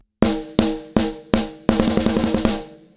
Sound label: snare; Lindell 7X-500